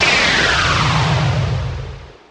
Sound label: slow poison; priest